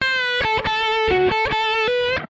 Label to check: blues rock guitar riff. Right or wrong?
Right